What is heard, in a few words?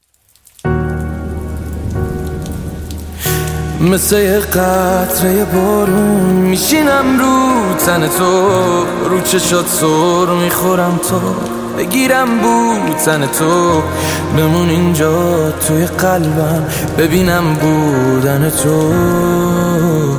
Piano Version